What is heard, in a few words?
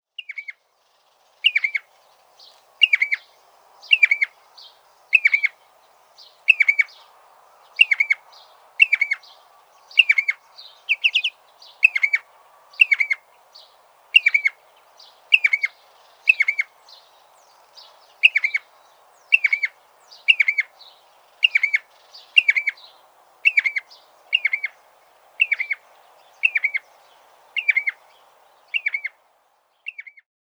striated Pardalote; pardalotes; canberra garden birds